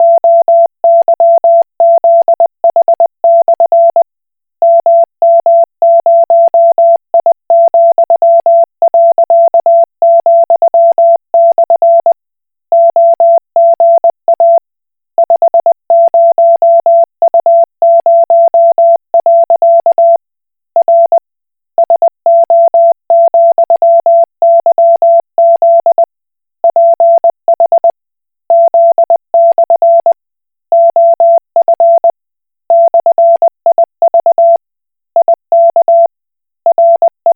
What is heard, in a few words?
Koch Morse